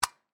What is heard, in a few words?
Power Cord Plug, Industry, In, Click; Machinery; BlastWave